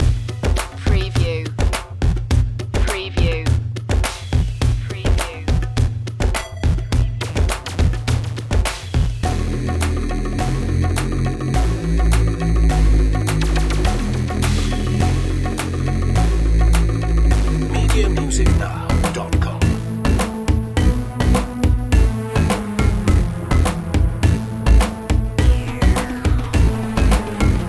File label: retro video game snake invasion loop